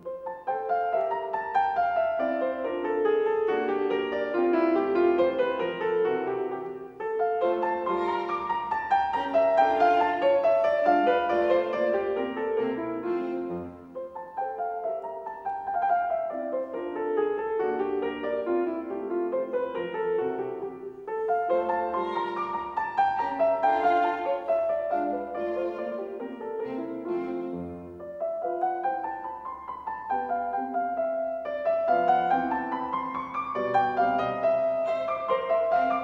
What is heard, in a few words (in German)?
Violinsonate F-Dur - Variation I